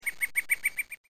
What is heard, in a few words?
flicker